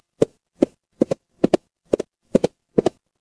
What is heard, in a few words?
animal horse gallop